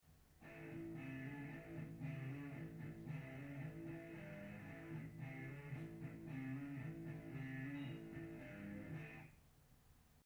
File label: overdrive